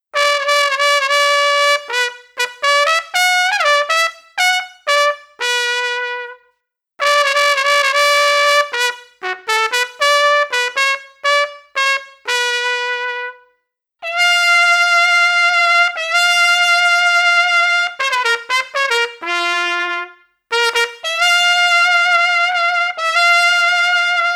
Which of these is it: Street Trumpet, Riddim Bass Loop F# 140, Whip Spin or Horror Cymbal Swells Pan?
Street Trumpet